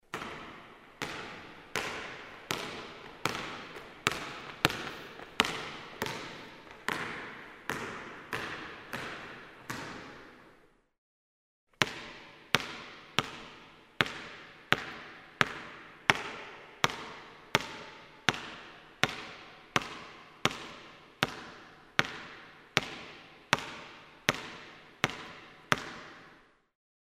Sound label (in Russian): zvuk vedenija mjacha v basketbole